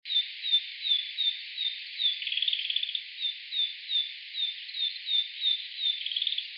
kibita

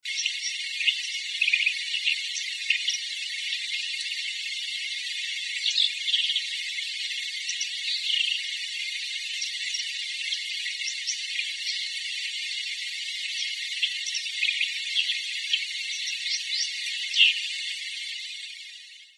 kawagaras

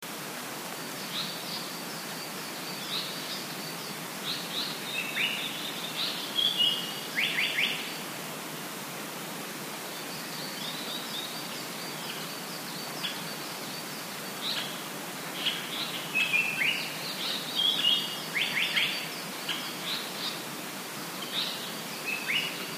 sanko

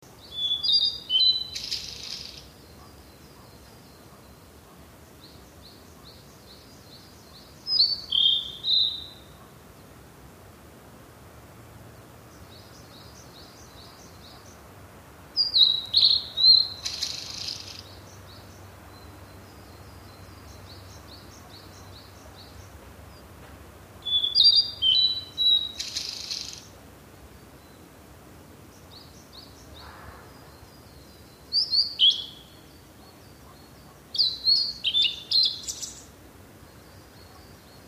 oruri

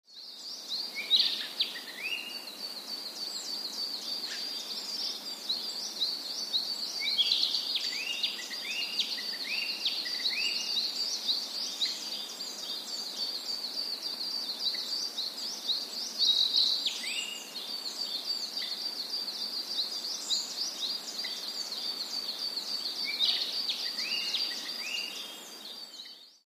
kibita

